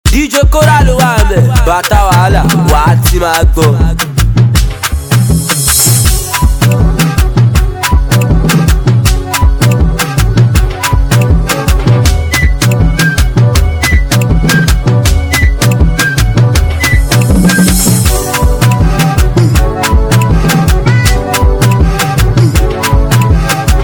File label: Beat